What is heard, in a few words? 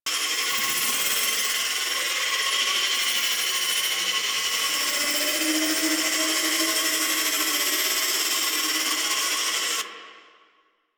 Fairy Sprint Loop; DE; Warframe